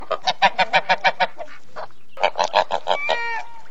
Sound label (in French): oie, Musique